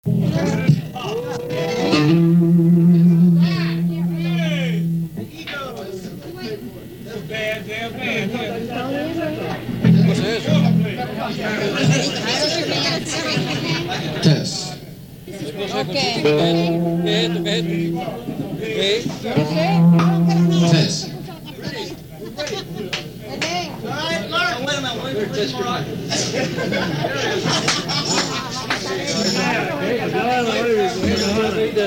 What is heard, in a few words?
Lofi Barroom Crowd Noise 1979